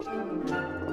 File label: Music Slow Theme Bpm64 Start; asterix obelix slap them all 2